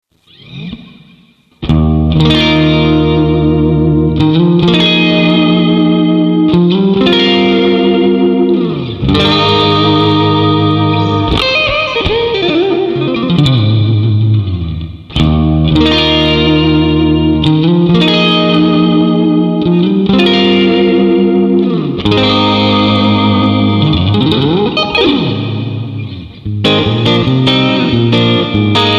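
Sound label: plate reverb; rocktron; cyborg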